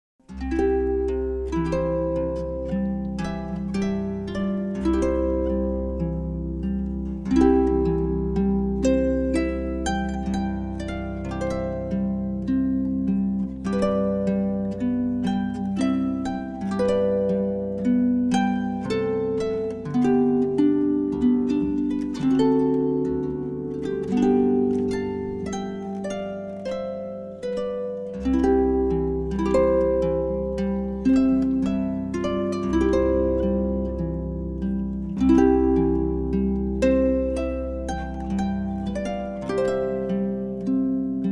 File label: Theme From Clarinet, harp; healing from the harp